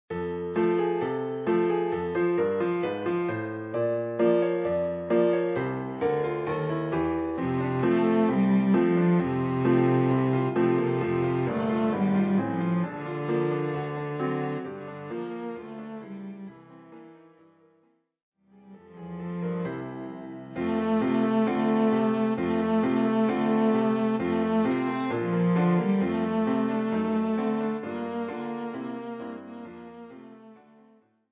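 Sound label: cello; Jingle Bells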